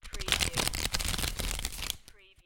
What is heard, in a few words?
Playing Cards Fan Flip Large; Casino / Poker, Cards & Dice